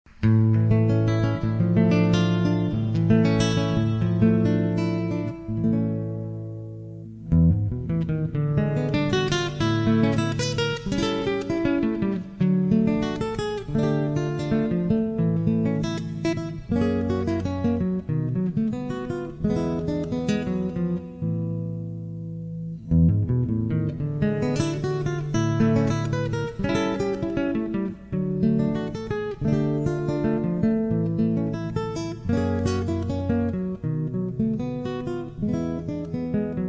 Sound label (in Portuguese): violao; recital 2007; dialogo